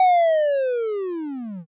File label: slowmo